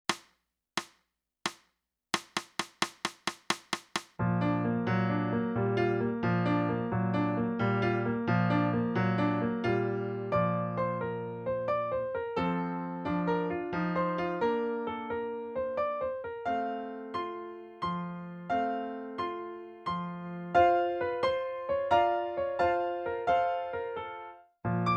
sax

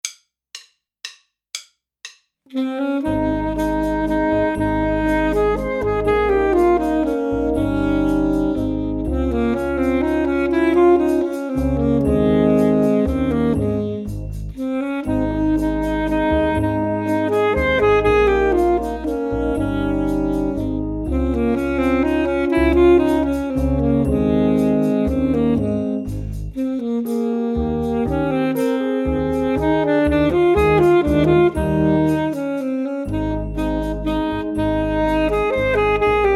Menuett Full Sax

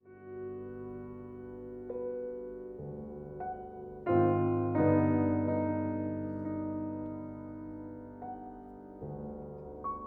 VIOLIN

